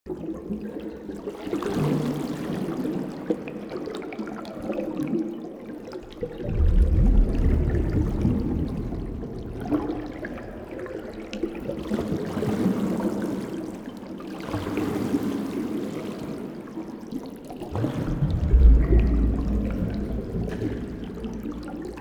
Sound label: Underwater Amb Loop; Maw Fishing